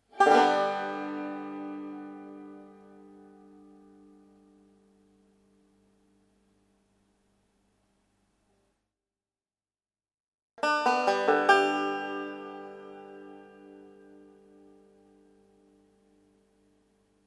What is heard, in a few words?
Various phrases on an open G 5 string banjo.; Guitars (Sound Effects:Musical)